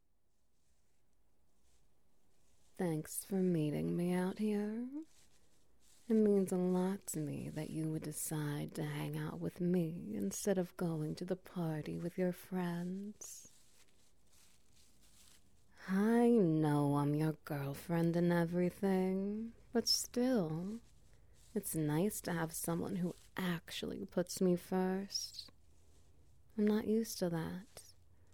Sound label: SFX Wet Noises